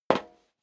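step leather shoes metal dry single left, footsteps; schritte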